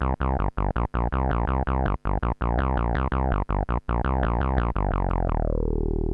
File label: disco bass